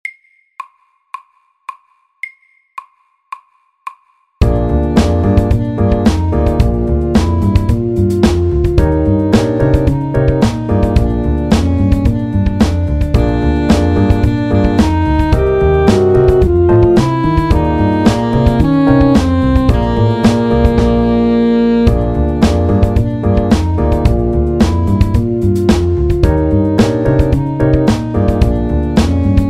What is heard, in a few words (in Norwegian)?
Kromatisk blues FORT